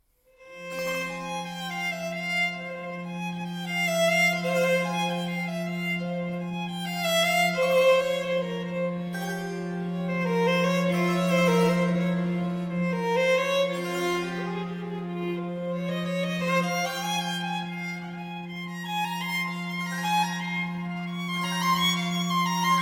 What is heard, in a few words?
Violin Concerto in F Minor, Allegro; RV 297, “L'inverno”